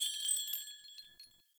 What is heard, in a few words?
Foley Arm Bells; Jotar; Friendly